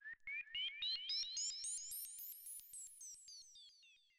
110BPM FX

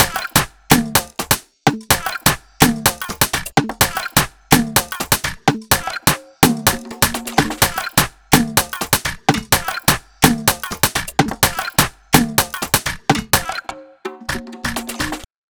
LOOP 126BPM

